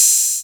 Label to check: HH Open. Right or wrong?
Right